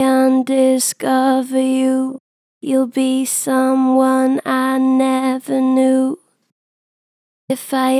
Gen Vib Undiscover you, Vocals, Natural Vibrato Gentle; Harmor Vocals